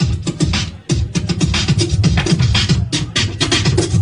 scratch beat; Drum loops

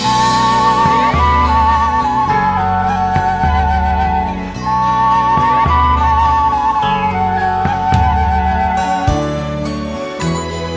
flute, Loops, Sad

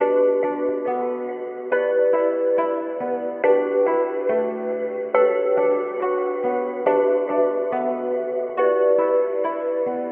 keys guitarish, Sad; loops

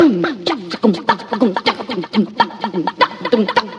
beatboxing, Drum loops

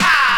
ahh

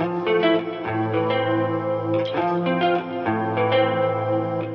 guitar, Jazzmatazz loops

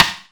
Rimshot, Snares

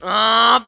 "ah"

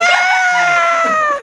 scream fake female; ooh ahh

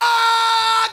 "ah", scream; ooh ahh

